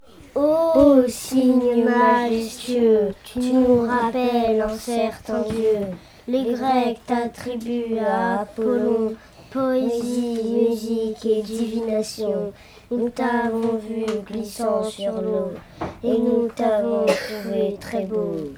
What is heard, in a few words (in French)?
oiseaux